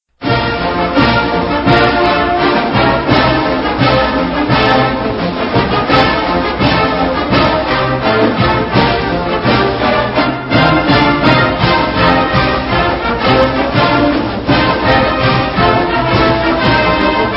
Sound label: Fight Song; WHS 1960; Wauseon